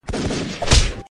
seeeep

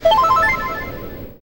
Heal

